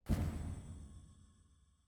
TV Button Press Mono Center; Forest SFX

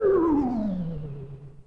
Shield Down

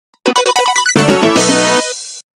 Level Start; Supreme (Adventure Mode - Level Start)

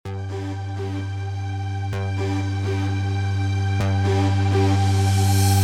miniboss intro 128bpm; wildcat gun machine ps4 switch windows xbox one gamerip 2022

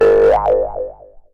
Animated Object Sound; Jolly Battle

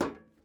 metal step

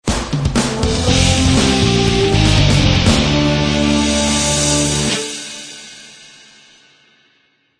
Battle lost; Blue Guardians

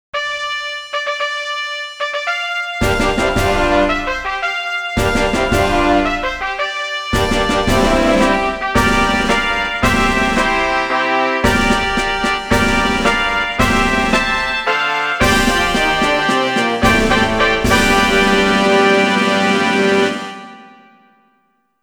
GI Race Fanfare; Tokyo - Nakayama

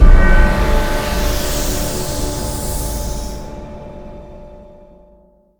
Level Up; Din's Curse

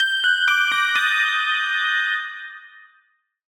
Jingle; YU-NO: A Girl Who Chants Love at the Bound of This World

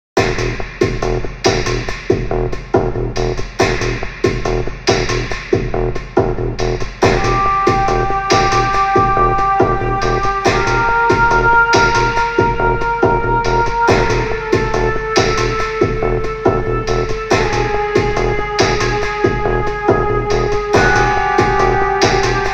Bridge Music

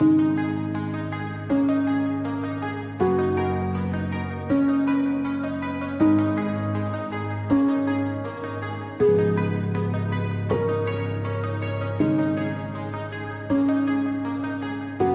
Music 160bpm; Sockventure

